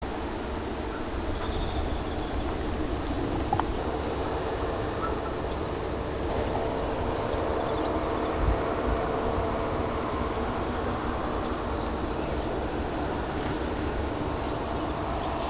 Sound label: Ocyris rutilus call; passeriformes; emberizidae; emberiza rutila; birds of the ussr